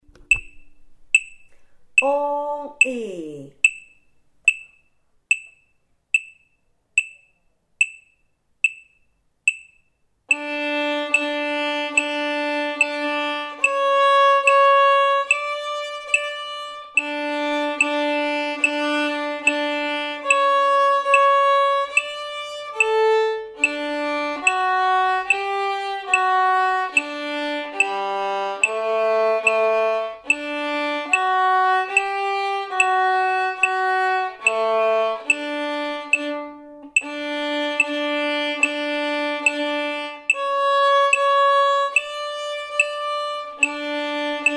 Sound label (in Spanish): acompañamiento